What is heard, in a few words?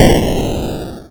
crash; The Adventures Of ASTROMAN; Ludum Dare 19